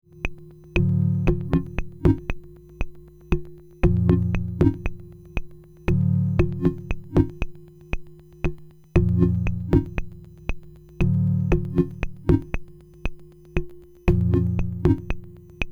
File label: arp 2500